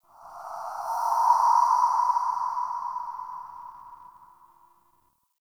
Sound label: Under City Spirit Gust